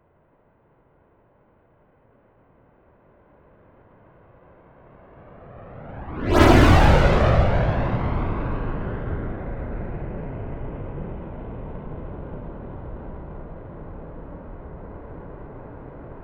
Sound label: military jet rafale flyby right to left fast close